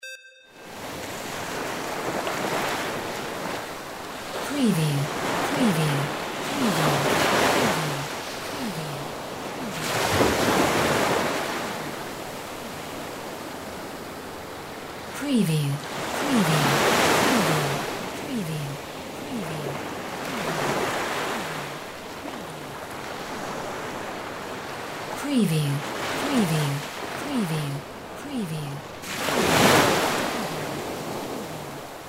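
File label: WATER SEASHORE WAVES; windy beach audio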